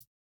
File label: no effect j city pop 1980 hihat